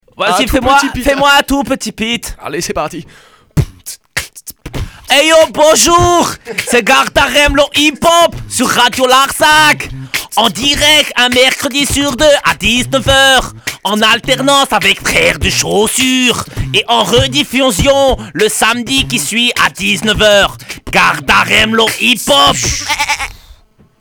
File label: hip hop